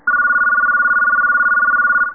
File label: ring; fax